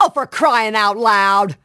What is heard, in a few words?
Female Crying